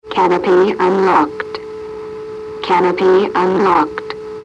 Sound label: Canopy Unlocked; B-58